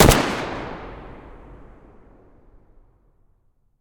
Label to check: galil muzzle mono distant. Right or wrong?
Right